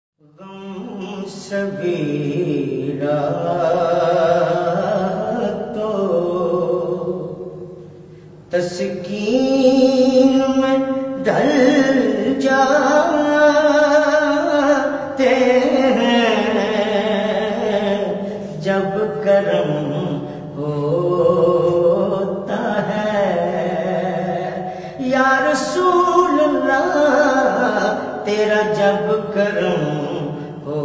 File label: URDU NAAT; AKHTAR QURESHI